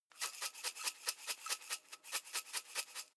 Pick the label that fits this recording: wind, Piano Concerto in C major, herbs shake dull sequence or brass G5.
herbs shake dull sequence